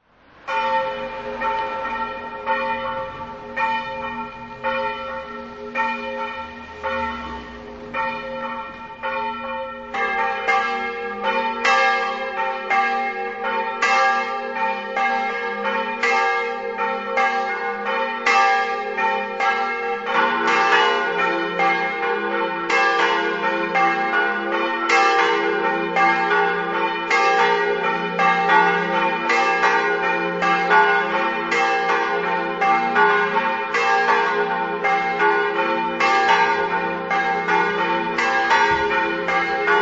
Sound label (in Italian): joannis, campane